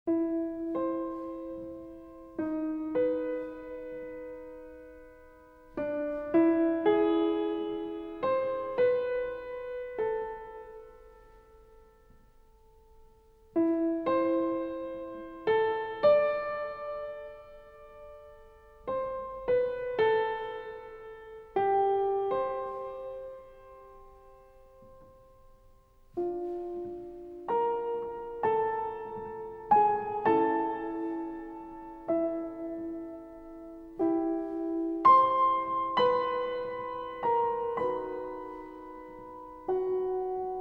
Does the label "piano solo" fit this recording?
Yes